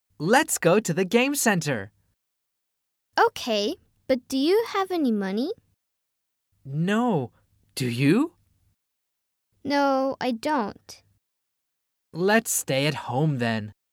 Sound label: chants